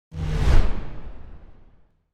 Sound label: Whoosh